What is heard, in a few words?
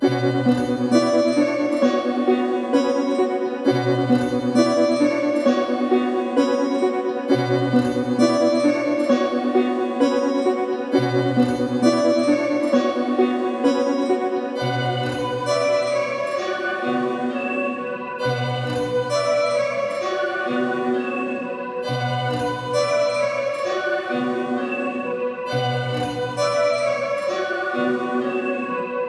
132 BPM B Min, Melody, Trap; Cymatics; 2022 Melody Collection; Trap Pt 1